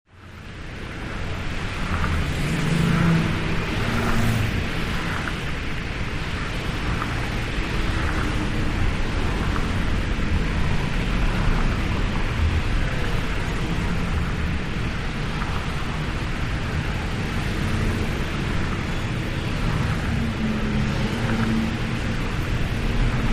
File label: Traffic Heavy Fast By, highway